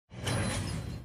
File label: stand sound effect; jjba